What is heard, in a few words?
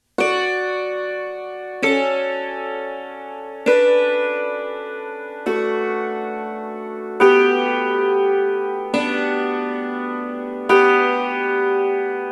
canon in d